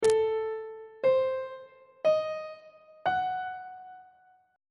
a sharp harmonic minor 7th chord 7 is g double sharp diminished 7th on treble clef